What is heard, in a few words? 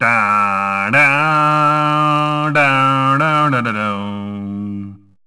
humming